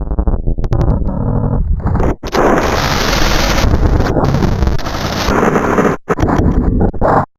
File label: glitch low